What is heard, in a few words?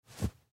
Towel Dry Set Down, Towel Dry Set Down Floor; Bathroom; Household; Blastwave